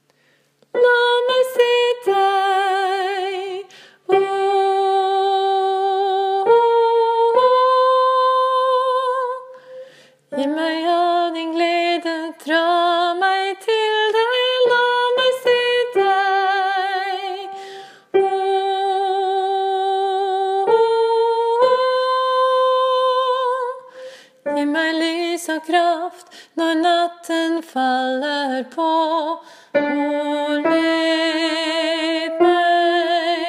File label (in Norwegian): bass; la-meg-se-deg; åfjord